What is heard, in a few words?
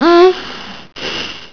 mmmmph